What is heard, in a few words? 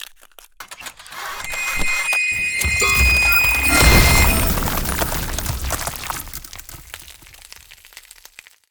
Ice Spell Ultimate Frost Nova; Ultimate Ice Spells; Arctic Aura